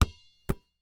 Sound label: Sports Basketball Bounce Catch